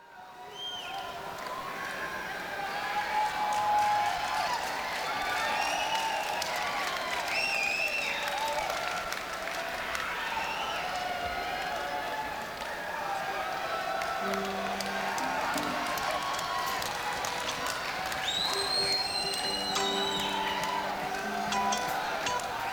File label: Crowd; sony ecm270